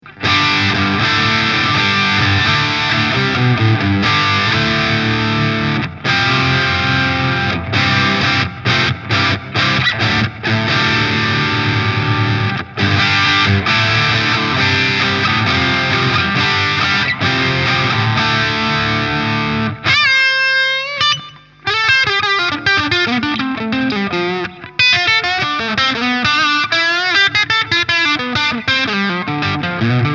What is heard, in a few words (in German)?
Mooer GC-112 verzerrt